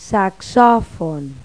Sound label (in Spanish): SAXOFON; Pipo-Aprende musica con Pipo